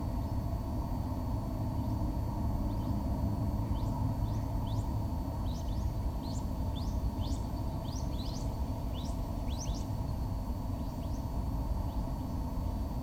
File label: Mandarin duck; اردک ماندارین